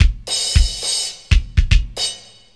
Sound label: Rimshot